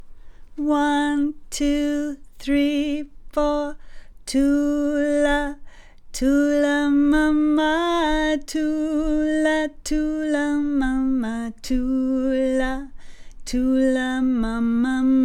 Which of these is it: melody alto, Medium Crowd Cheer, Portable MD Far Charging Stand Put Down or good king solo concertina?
melody alto